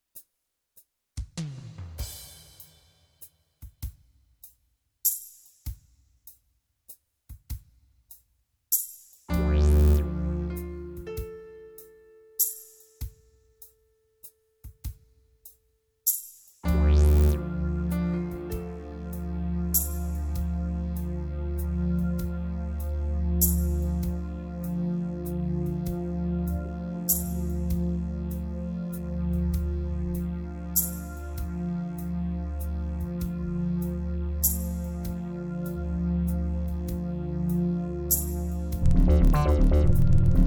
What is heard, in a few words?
Slow Waltz